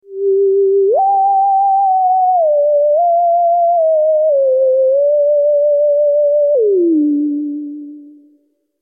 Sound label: martenot